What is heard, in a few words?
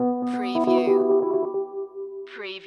Hip Hop Dark Rhodes Licks 90 BPM Swing - E